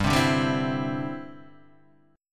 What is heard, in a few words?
D 7th Suspended 2nd Suspended 4th, G